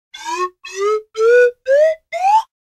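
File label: baloon bigger, smeshnye multiashnye zvuki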